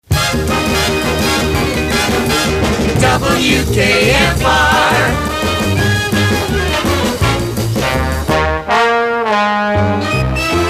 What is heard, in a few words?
Half and Half Vocal Instrumental; WKFR; PAMS; (Vocal first half of logo, instrumental 2nd half)